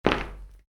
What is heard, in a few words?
Soccer Boots: Individual Footstep On Concrete; Footstep Sound Effects; Footsteps (Sound Effects:Human); football boot; Stuart Duffield